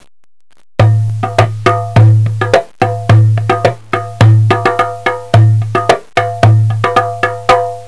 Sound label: instrument darbouka ceramic